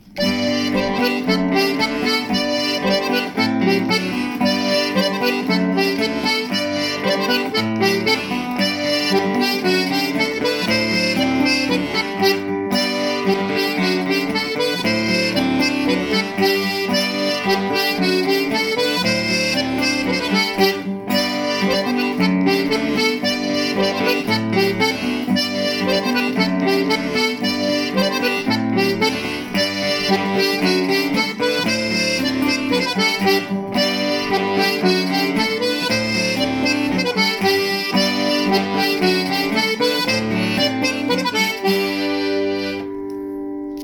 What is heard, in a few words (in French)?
instrumental; La fille du president